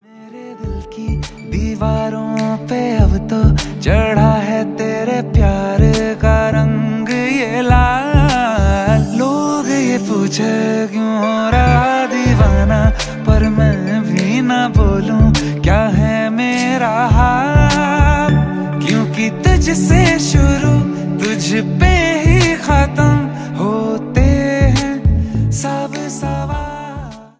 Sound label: Ringtone